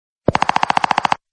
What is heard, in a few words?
Robotic Notification Ringtone; Nothing Phone